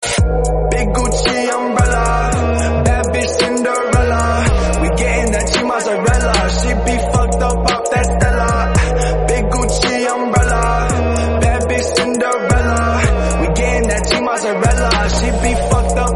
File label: english song